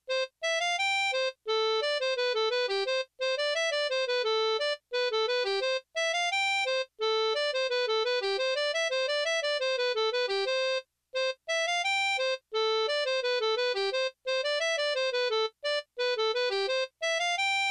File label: C harp